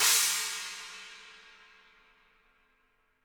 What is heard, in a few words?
HiHat & Crash, Roland TD-20; HiHats